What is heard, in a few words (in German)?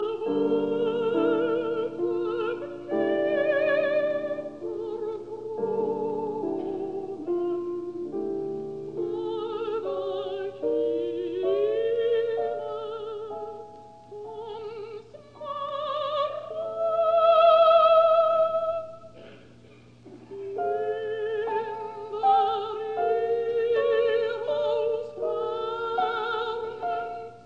fur eine Frauenstimme; 5 Gedichte; Wesendonck Lieder; Im Treibhaus; In the Hothouse